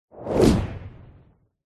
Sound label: whoosh fast powerful; sound design; zapsplat